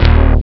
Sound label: Bas Synth; sonix; chipmusic